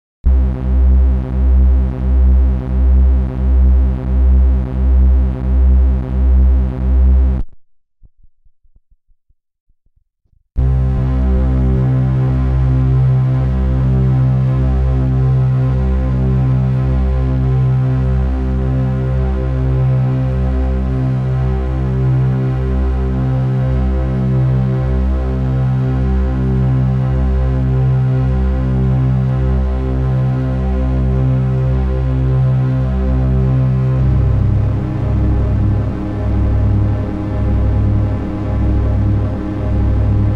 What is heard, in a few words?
dualtone